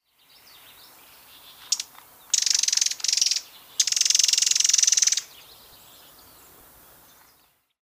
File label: Common Yellowthroat, Geothlypis trichas; warblers